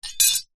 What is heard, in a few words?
knife set down concrete